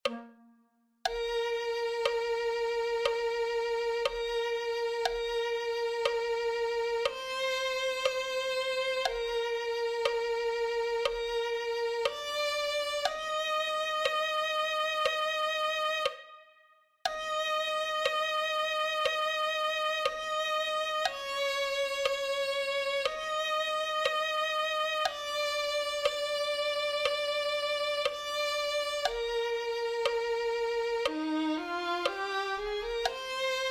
violin

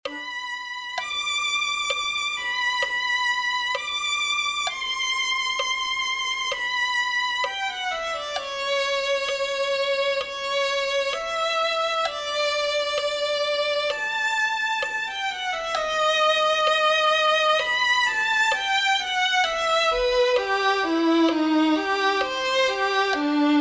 violin

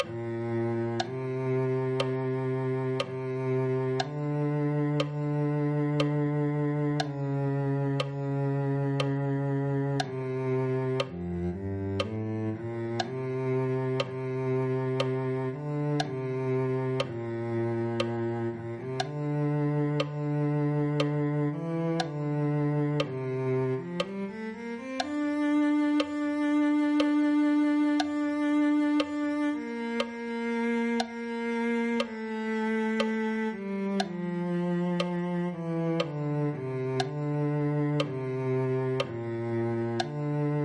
cello

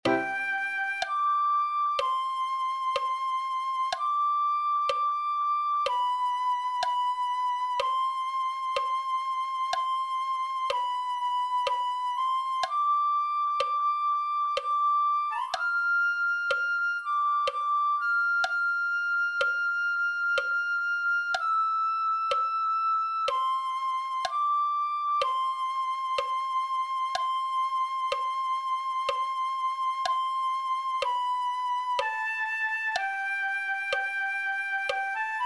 flute

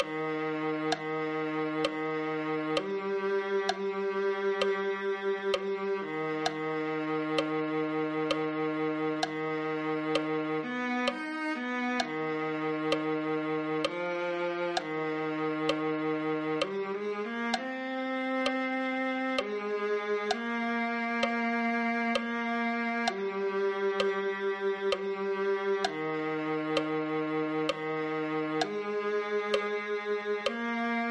viola

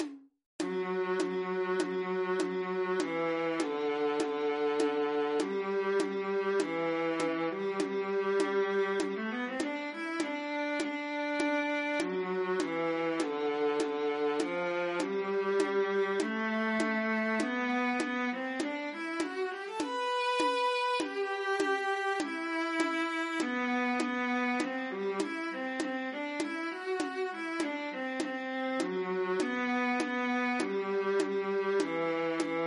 viola

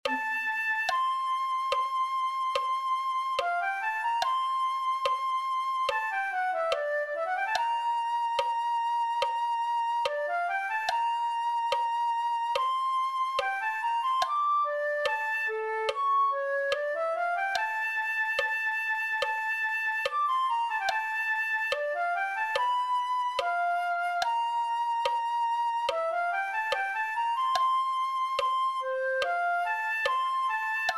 flute

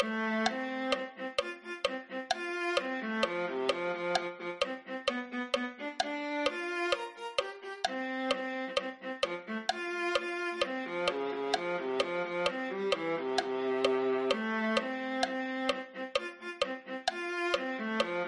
viola

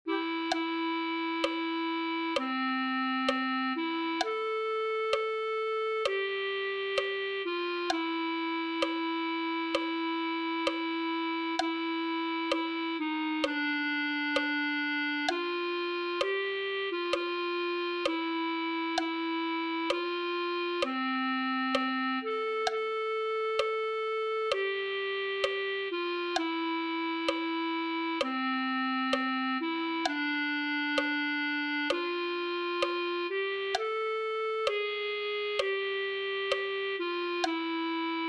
clarinet

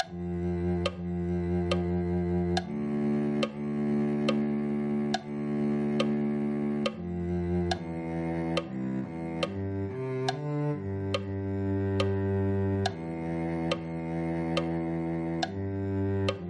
cello

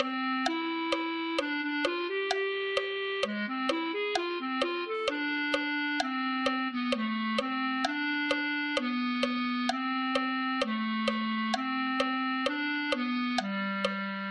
clarinet

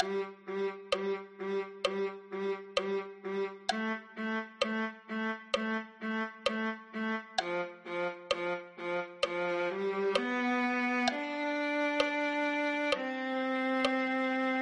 viola

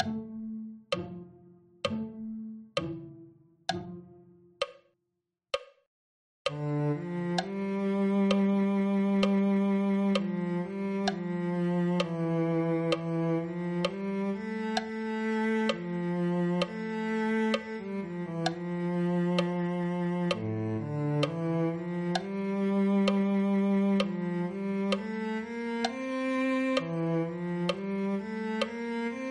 cello

